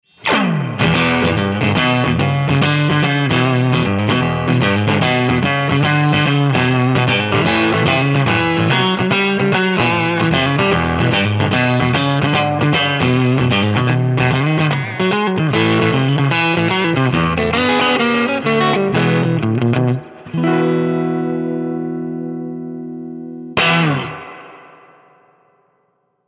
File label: 6L6 Strat Blues